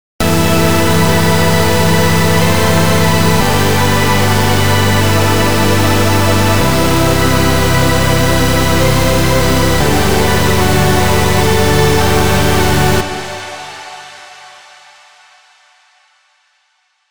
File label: Supersaws Chord Melody